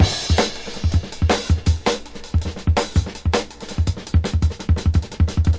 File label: crashin rollin, drum loops and breaks, 162 bpm; 161 to 180 bpm